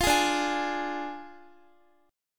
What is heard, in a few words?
D Diminished D, Guitar; Ddim strummed